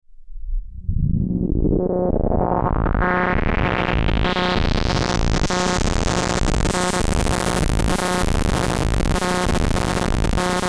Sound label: Moog Subharmonicon Patch X-Modulation